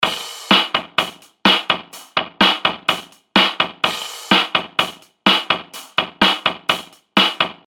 DRUMS vintage

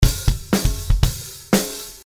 Beat No TT

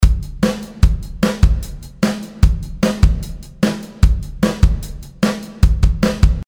Ultra Pitch Wet

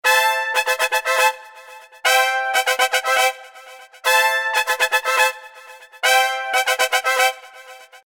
brass dry

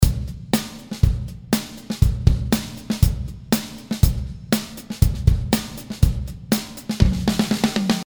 BFD3 Dry

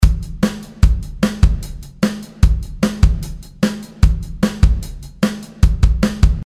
Ultra Pitch Dry

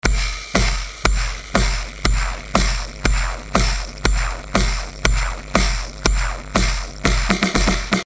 DRUMS LOFI

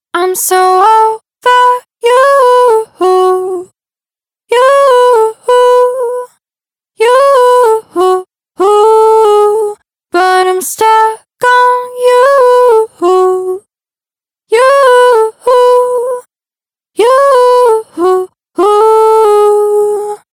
Vocal cryspy

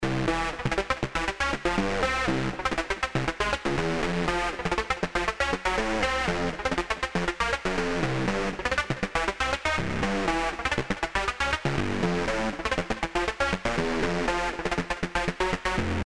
Synth Dry

